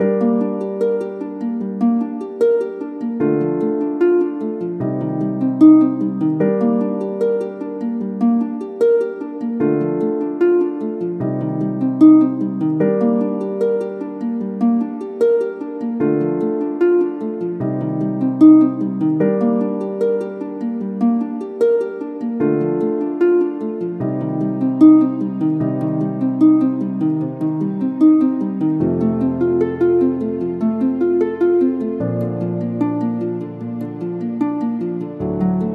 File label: dramatic drum comp no violin; by burak sound bank; dramatic dram vol 15